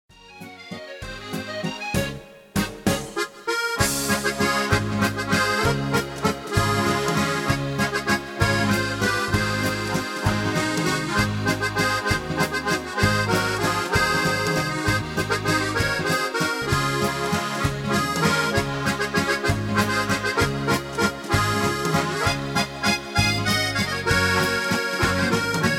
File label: HOHNER ACCORDEON